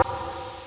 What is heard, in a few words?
knock wall; metal gear solid